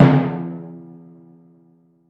ambient tom drum sound d key, Royality free tom drum sound tuned to the D note.